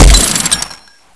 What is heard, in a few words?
m134 fire, weapons